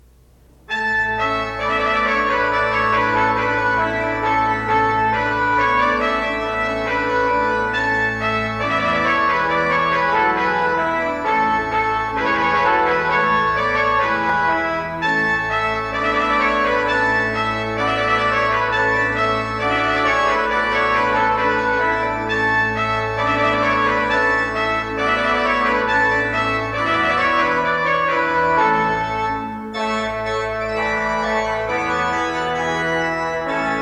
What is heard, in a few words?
Trumpet Fanfare; Adestis Fidelis